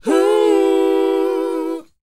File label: WHOA D, Vocal, R&B & Dance; ILIO; Vocal Planet